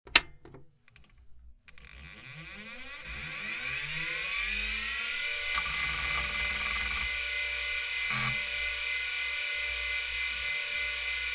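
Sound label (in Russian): компьютер включение выключение гудение вентилятора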